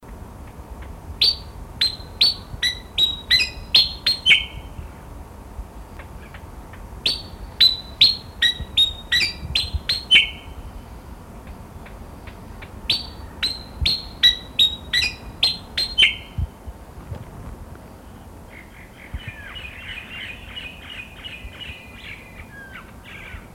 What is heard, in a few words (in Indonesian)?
kipasan kebun